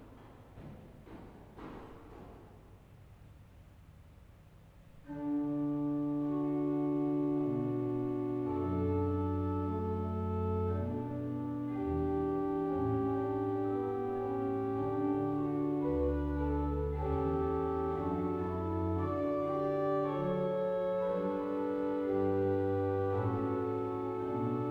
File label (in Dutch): air, orgel; Havelte; Battishill